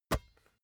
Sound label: basketball catch